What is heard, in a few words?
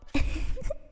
giggles